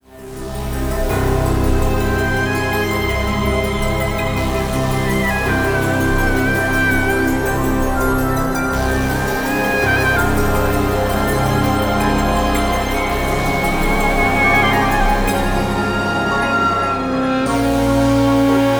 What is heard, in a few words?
Cinematic Mysterious Fountain; Shantae Half-Genie Hero